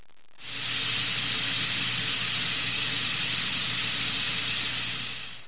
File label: gas esc